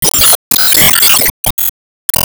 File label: Enemy Iceberg Active Both Arms Press